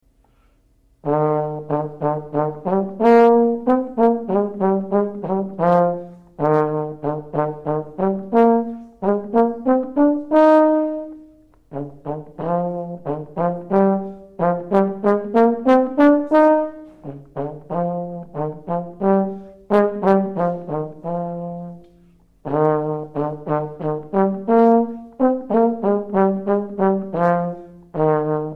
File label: trombone euphonium